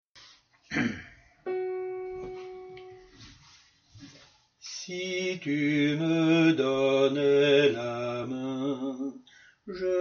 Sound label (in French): soprano